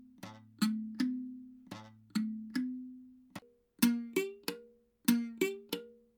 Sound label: gongoma